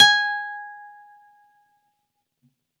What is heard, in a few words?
Norm G#5, Campfire Nylon Guitar